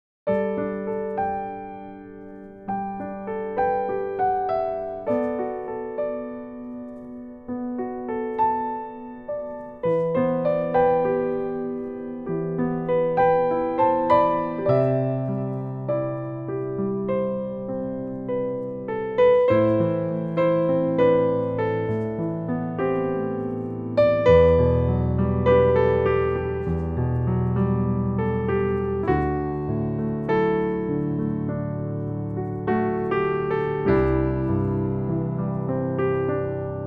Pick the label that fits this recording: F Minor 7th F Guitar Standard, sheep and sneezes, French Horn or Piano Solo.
Piano Solo